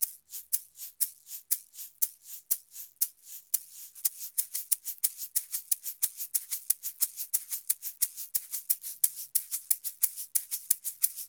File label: Eggs 120; Best Service; Festa Latina VOL-1; Drums & Percussion